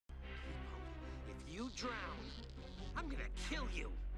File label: drown